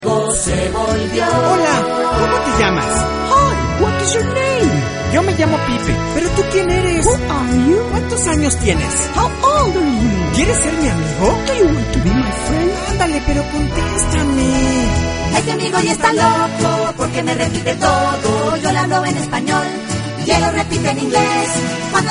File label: pipe